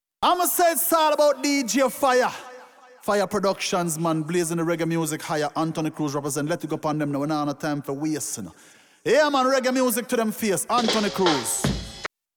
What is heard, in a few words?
Dead Inna Dance Jingle